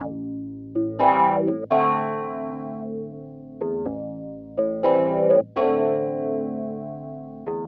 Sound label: organ house 125 A; eJay; Keys